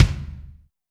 kick, Zoom MRT-3B

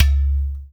UDU; Spectrasonics; Heart of Africa

